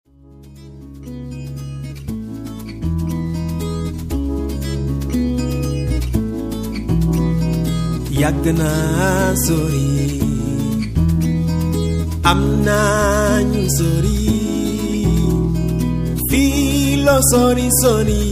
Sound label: Acoustic Africa